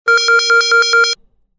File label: alert